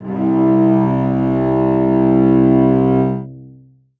string acoustic, cello

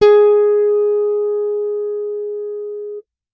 bass electronic, electric bass finger

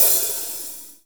hi-hat open, rock drum kit

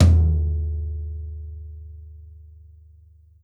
floor tom, rock drum kit

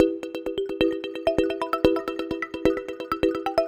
e bottles

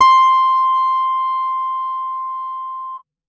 bass electronic, electric bass finger

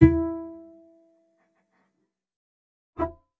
bass acoustic

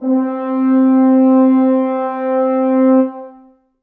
brass acoustic, muted trumpet

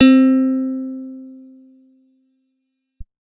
guitar acoustic, acoustic guitar nylon

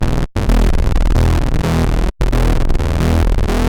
e oct, edm bass

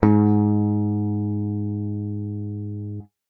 guitar electronic, clean electric guitar

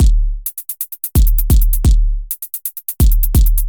cymbal detail, edm drum

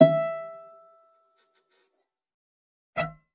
bass acoustic

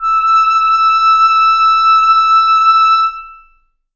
reed acoustic, clarinet

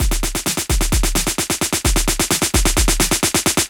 build, edm drum

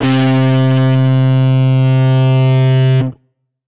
guitar electronic, distortion guitar

